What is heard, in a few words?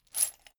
chain mail armor movement